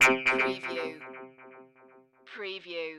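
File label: Digital Distortion Chime Click Stereo Delay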